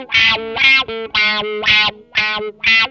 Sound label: EGYPTIAN, Distortion Gtr; PlatinumPhattCD2; E-MU Producer Series Vol. 8 – Platinum Phatt